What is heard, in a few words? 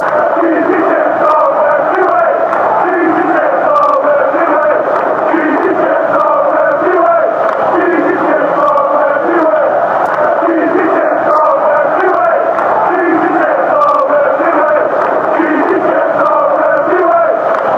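cheesy chips, football chants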